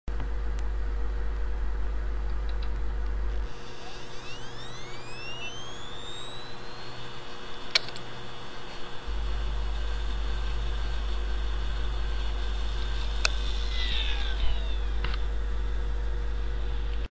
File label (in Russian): Zvuk vinchestera IBM bez rekalibrovki obychno byvaet v sluchae zameny kontrollera i nesovp